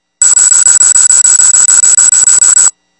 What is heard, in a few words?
Cart Key Unlock; Momentum-Firmware